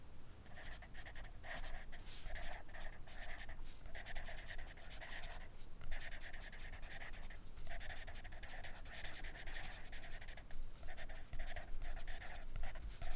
kagida kursun kalemle yazi yazma sesi

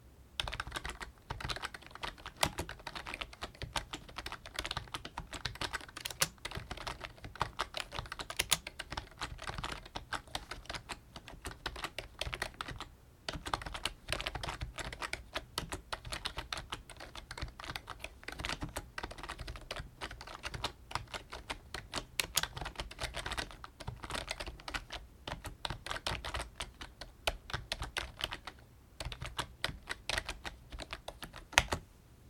membrane klavye hizli yazi yazma sesi; corsair

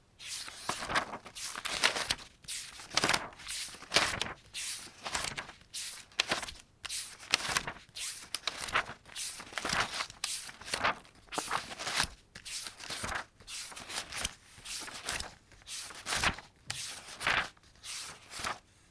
defter sayfasi cevirme ve kagit sesi

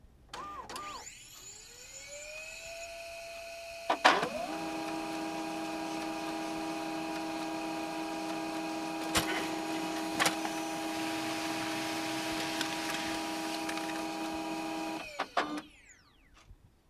yazicidan cikti alma sesi

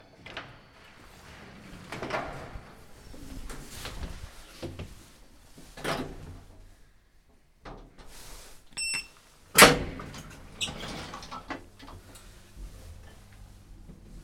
asansor kapisini acma ve asansore binip dugmeye basma sesi; kat dugmesine basma sesi; asansor kapisini acip kat dugmesine basma sesi

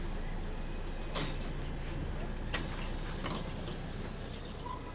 otobus kapisinin acilma sesi; iett otobusu kapi acilma sesi

